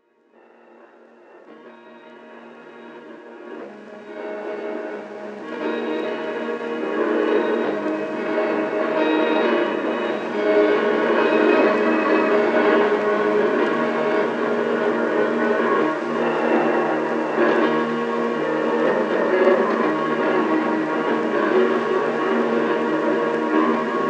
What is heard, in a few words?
piano whispers